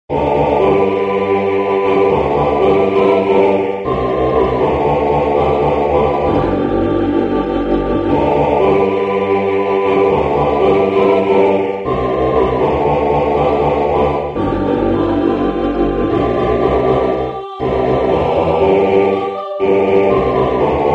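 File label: siyahamba bass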